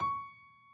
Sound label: key; keySounds